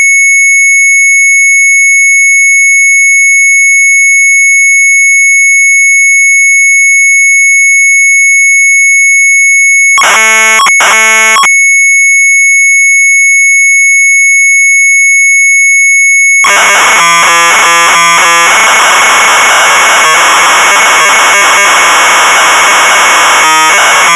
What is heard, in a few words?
MZ800 Tape; LASER STRAAL; sharp; retromelsarchive